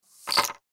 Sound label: chips to center; poker game